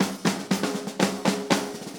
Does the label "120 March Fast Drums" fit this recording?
Yes